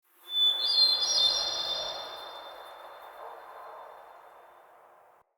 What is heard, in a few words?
Wetland Forest Hermit Thrush; Midrath Island; Ambience